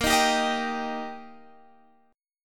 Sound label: D sharp Major, A sharp